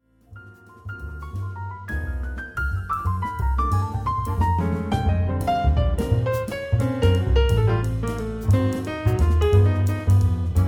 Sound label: waltz